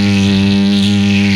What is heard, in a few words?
Electric Loop; retro arcade; MMRetroArcadeSoundsPack